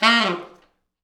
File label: T Sax falls, Horns Sax